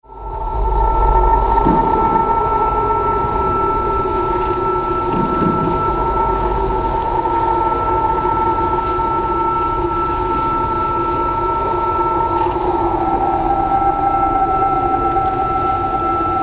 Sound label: Front Speaker